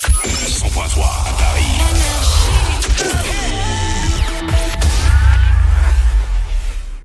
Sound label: JINGLE; PARIS, 100.3 FM; NRJ